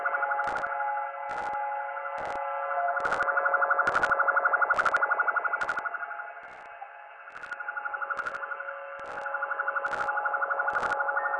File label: pulsing